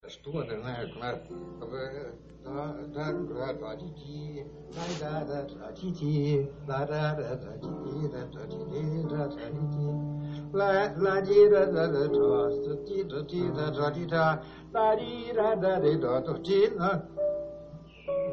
sofejando

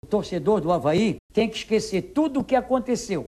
torcedor avaiano

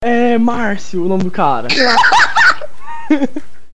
risadao

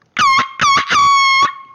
buzina prolongada

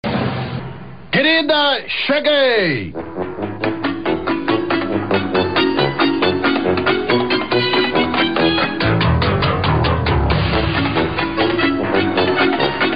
abertura; familia dinossauros

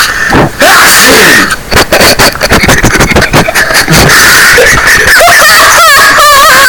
espirro bass

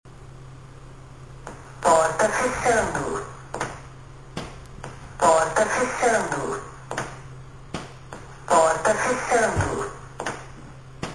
porta fechando

